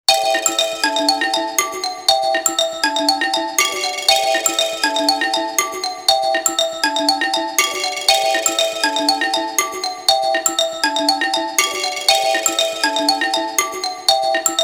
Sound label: Xylo Loop; Soundzabound